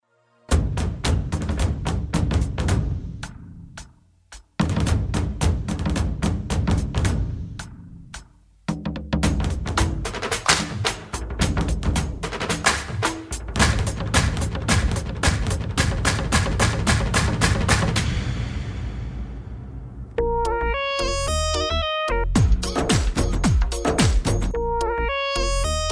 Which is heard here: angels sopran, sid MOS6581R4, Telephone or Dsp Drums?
Dsp Drums